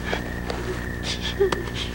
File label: CRYING